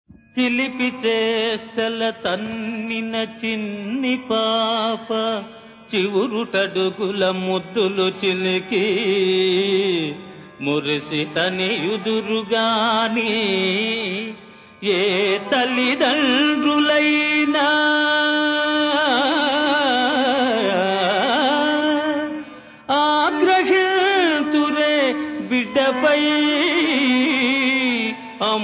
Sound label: Padyam; SriVenkateswaraMahatyam(1960); Chilipi